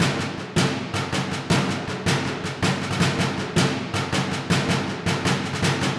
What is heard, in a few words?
Snare; Сэмплы ударных для фильмов: Snare C; Movie Score; 80BPM; Drums; film music; Барабаны